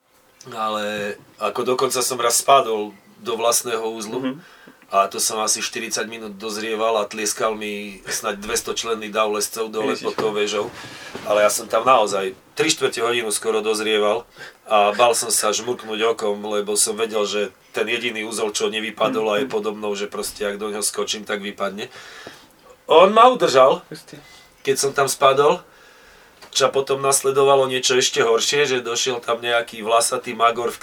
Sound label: Pad